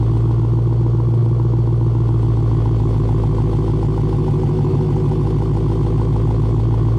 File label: idle, engines; DEMON